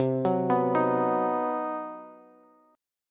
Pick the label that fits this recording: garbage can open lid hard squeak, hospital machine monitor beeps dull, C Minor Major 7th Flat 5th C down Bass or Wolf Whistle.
C Minor Major 7th Flat 5th C down Bass